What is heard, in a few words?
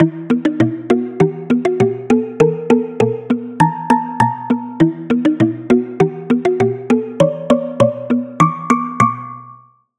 Percussion - Temple Block; Routed Sound; Serum 2 Expansion; Story Walker Vol.1